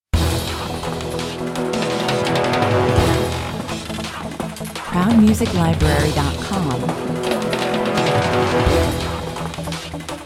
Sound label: action cues car race, 106 bpm